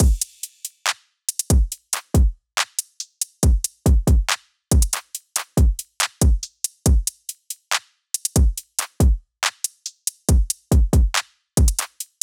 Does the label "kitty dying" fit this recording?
No